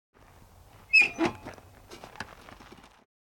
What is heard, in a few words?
Motorbike kickstand being placed; vehicle